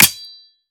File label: melee world knife cement; viper